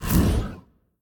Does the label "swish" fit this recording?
Yes